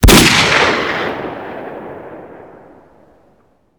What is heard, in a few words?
svd shot, weapons